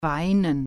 cry

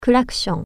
horn

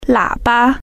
horn

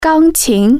piano

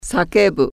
scream

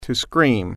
scream

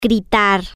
scream

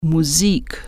music

